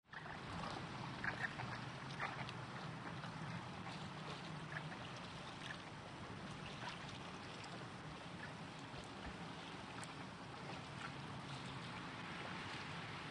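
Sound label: Fish Reel Short Cast, fishing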